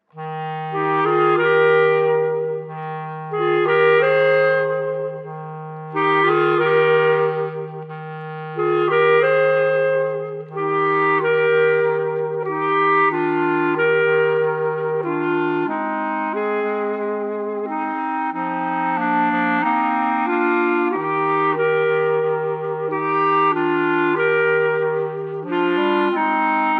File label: Clarinet Trio; beginner trios for clarinet